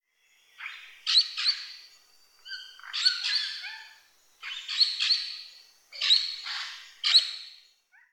Gelbrueckenstirnvogel Cacicus cela; Vogelstimmen

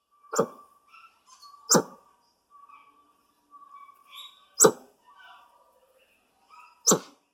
Noerdlicher Helmhokko Pauxi pauxi

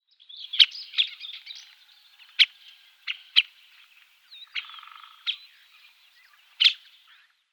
Blutspecht Picoides syriacus; Vogelstimmen

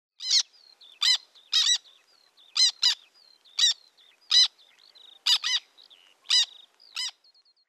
Trauerseeschwalbe Chlidonias niger; Vogelstimmen

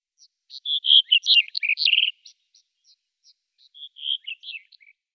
Kappenammer Emberiza melanocephala; Vogelstimmen

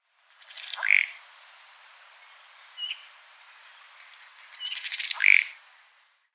Schwarzkopf-Ruderente Oxyura jamaicensis; Vogelstimmen

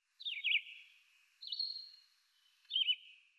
Rotaugenvireo Vireo olivaceus

